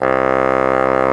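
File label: HARD BASSOON C4; Northstar - New Gold VOL-2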